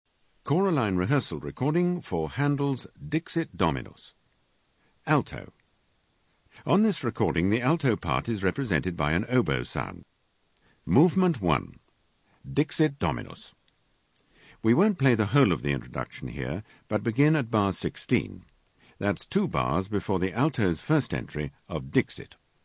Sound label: Alto; Handel Dixit Dom